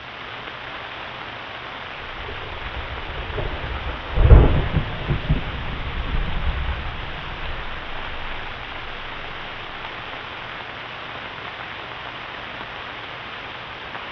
thunder, ambience; dmc

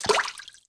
player slosh; firearms

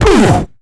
fall

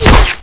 body hit

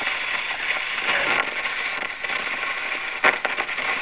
radio

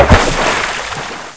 splash

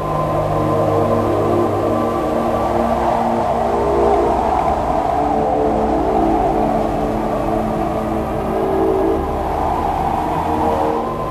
glassy loop; svencoop

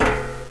grate

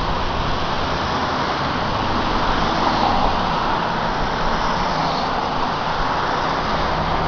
streets

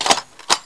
svdex draw; cstrike

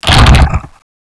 bite; gearbox; renesaur

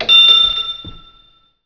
ding

